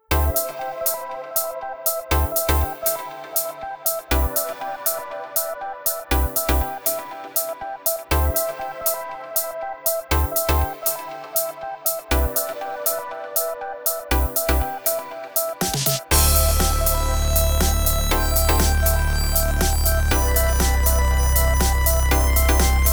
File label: fluctuation dance